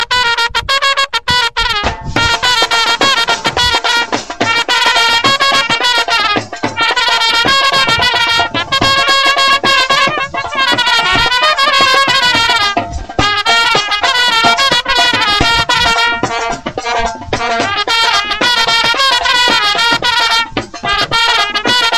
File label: Indian Wedding Dance